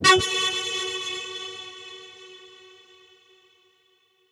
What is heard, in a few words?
Techno FX 135; Loops; Ghosthack; AC2024 - Day 1 - Techno Sounds 2025